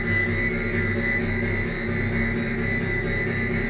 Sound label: Screamer; Game rip; MiSide